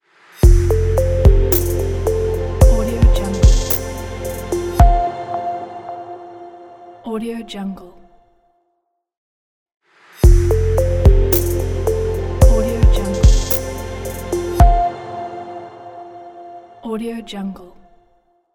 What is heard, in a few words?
ambient logo